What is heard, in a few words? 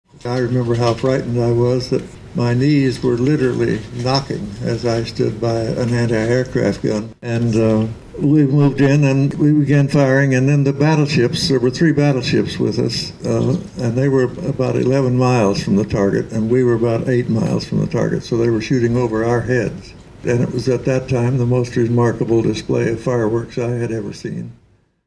pips